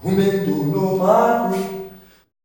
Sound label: UNA SOLO, MALE; Spectrasonics; Heart of Africa 2; S.MALEAMB